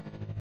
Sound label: buzz